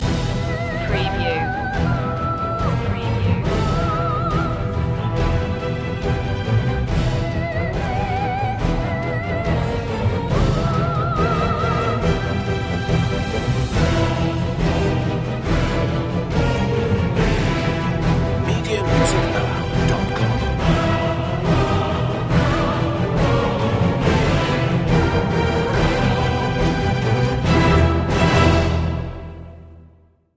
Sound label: full mix